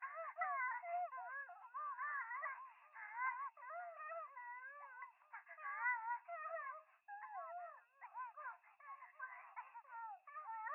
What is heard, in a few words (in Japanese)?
電話 可愛い喘ぎ 山奥夜, ASMR